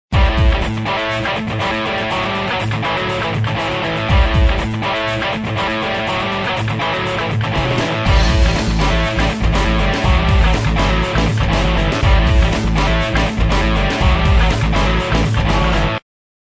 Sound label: Smooth Lead Tones